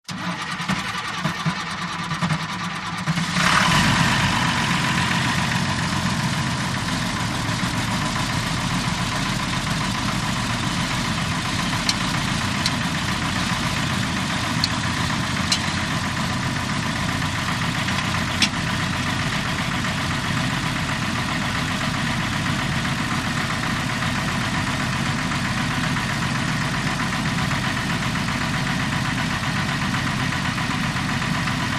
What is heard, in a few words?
VEHICLE - CONSTRUCTION JOHN DEERE 750: EXT: Start, idle, switch off, cold day.; 750 Dozer